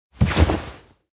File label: turn away; chiharu; anemoi